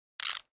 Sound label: Flash Gear Multi Clic